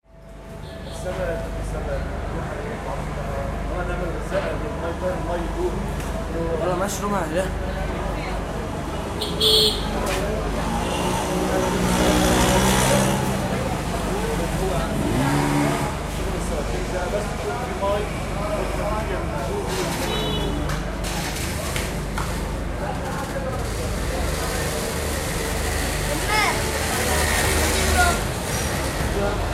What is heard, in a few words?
ambient mopeds; syria street